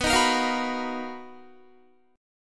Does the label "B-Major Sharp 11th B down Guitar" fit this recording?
Yes